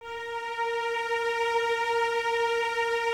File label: CES A#4, String Ensembles Cellos; Miroslav Vitous; 90 s Sample CDs